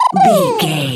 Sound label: goblin fairy expression emote